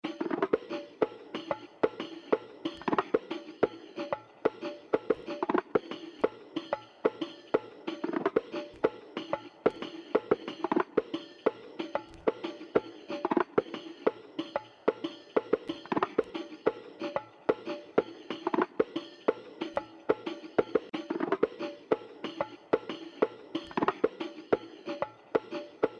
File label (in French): SANS VOIX